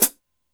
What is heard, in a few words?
Cym Hard Foot, Drum Machines Alesis D4